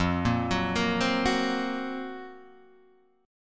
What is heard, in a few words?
Gb Major 7th Flat 5th